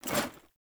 Foley Action Armour Melee Swipe; Characters; Deora Armour

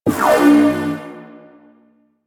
Shot Layer, Blastor Pistol; Warframe; Weapons; Corpus; Plinx

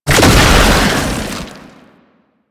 Stun Start, Foley; Enemies; Warframe; Proto Infested; Babau

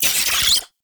Oraxia Brood Spider Vocal; Warframe

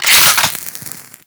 Pistol Clip Eject; Warframe; Grineer; Grineer Akimbo Pistol

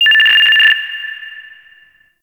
Interior Orbital Station Telemetry High; Warframe; Tau

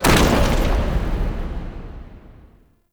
Drawbridge Stop; UnderCity

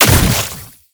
Brood Projectile Impact; Warframe; Oraxia

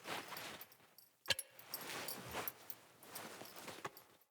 Foley Action Default Totem Pickup; Player

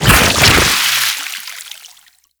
Pirate Liquify Impact; Warframe

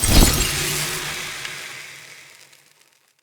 Pipe Damaged; Encounters; Ode Pollution Tower

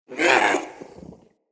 Pet Speak Short; Enemies; Catbrow; Pet Ship

